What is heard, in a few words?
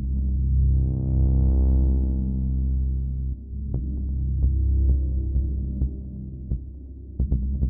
Ambient Mobile; MiSide